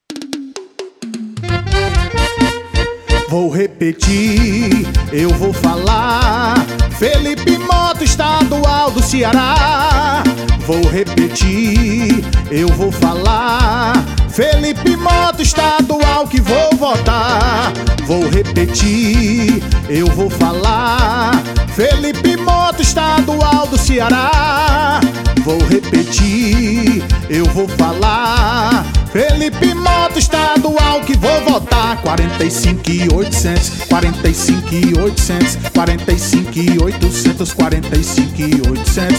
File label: jingle